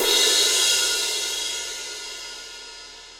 Crash; Torchlight III; PS4

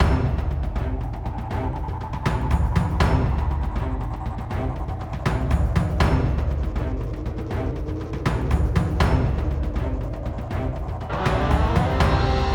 Music Heavy Combat

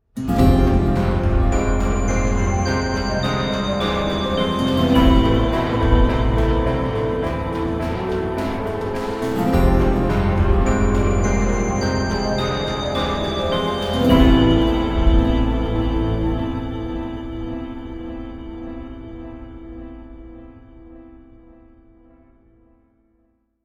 contemplative; Joe's Diner

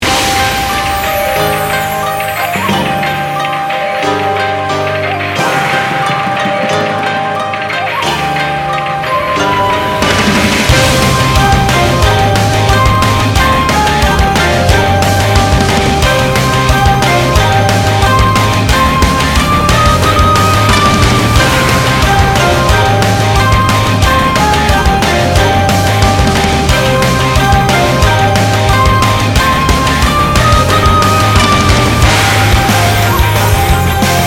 Raid; Mist Train Girls

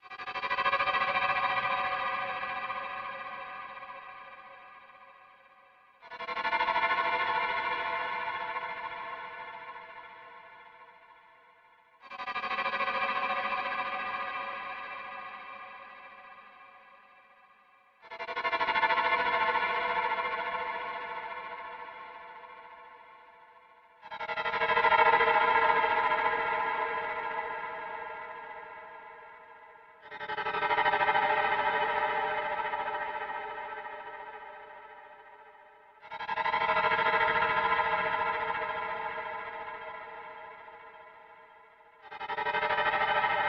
confusion

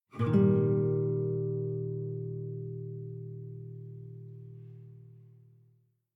Savannah Music; kenshi windows gamerip 2018

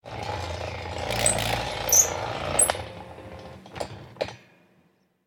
Grind Water Open; android mobile gamerip 2019; Granny: Chapter Two

